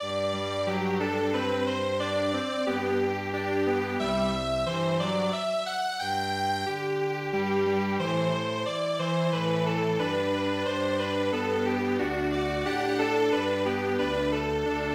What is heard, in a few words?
minuet Strings Quartet